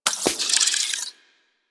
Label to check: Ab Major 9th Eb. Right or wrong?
Wrong